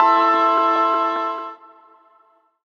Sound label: PIA MOOD FX; Rawcutz; Nu Forms